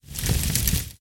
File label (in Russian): korotkij negromkij tresk razgorayushihsya suhih vetok